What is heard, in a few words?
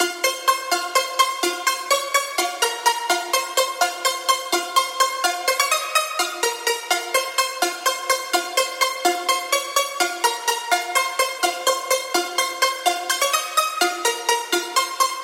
Serum LD Pluck